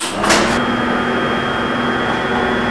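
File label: elevator move; XDM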